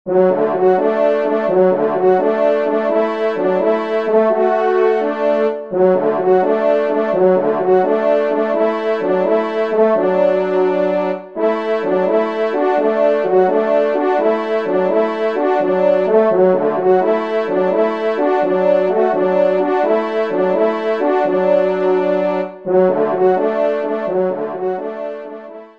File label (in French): Allegro de chasse, trompe; 2e Trompe